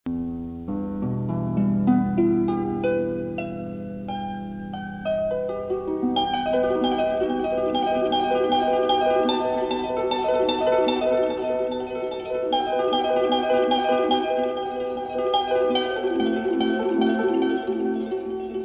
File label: instrumental; persian